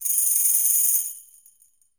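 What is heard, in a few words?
Tamborim; Latin Percussion; Drums; Drum Hits; Tambourin; Ableton; Factory Packs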